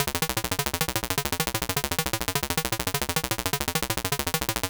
70s Random 102 C#, 70s Sequencer Synth Lines; Zero-G Kocktail Kollection

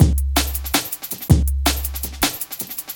breaks 162

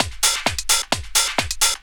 drum 130, techno trance drumloops

